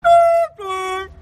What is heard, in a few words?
foreign train sound